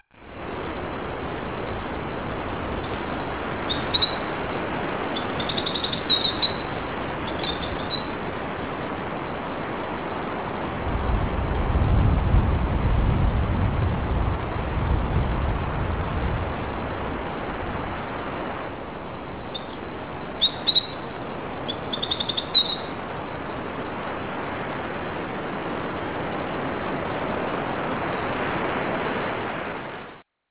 wind; Sensorium; kawasaki

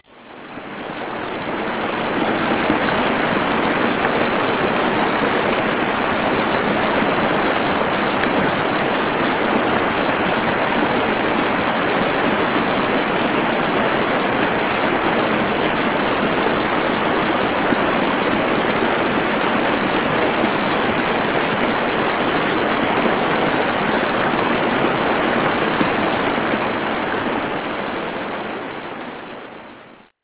stream; Sensorium; kawasaki

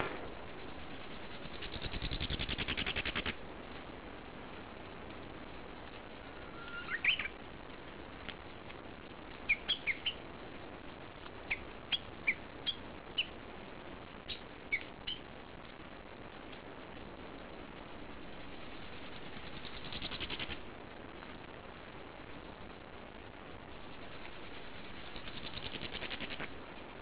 birds; Sensorium; kawasaki

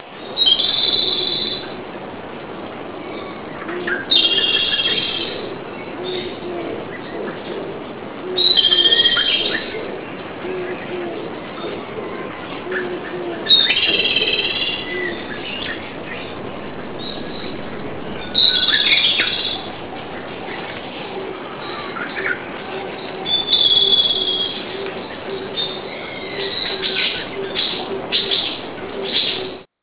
birds; Sensorium; kawasaki

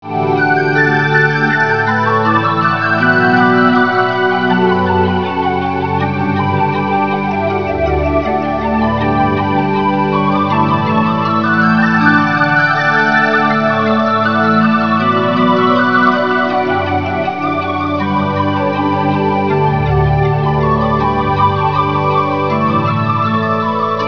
canon

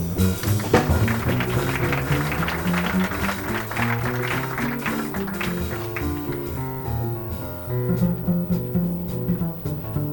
Bass; Hannover

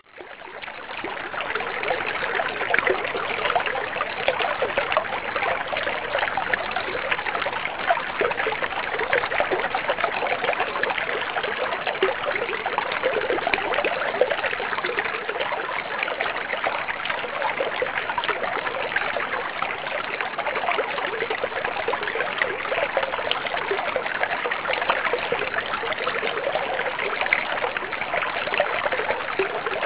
stream; Sensorium; kawasaki

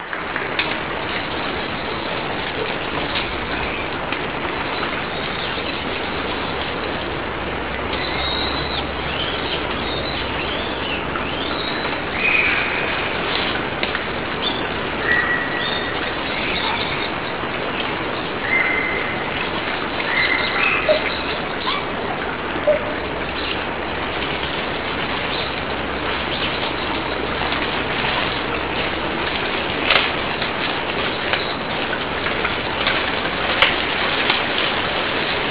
monkey; Sensorium; kawasaki

